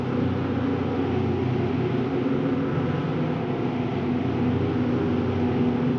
Panned Organ, non tempo loops

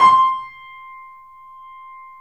C -R, The Piano; InVision Interactive - The Piano

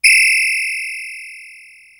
WHISTLE LG; CUICA+WHISTL

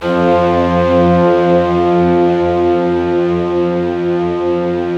BIG ORCHESTR, Yamaha VP-1; Music Store; 90's Sample CDs

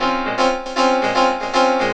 P. CHORDS, KEYS

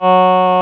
vocoders; Sound & Vision - Gigapack I CD 2

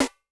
Steel Rim, Snare; Yamaha DTXpress-II

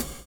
SHUFFLE; Univers Sons

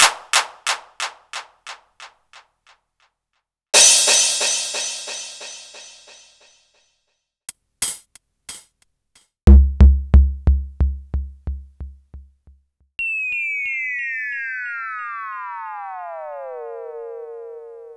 Korg Electribe ER-1 Kit

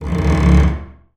TALK BOW, BASS FINGER; Xsample VOL-5

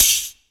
CRASHER; AKAI S-Series CD-ROM Sound Library VOL-5

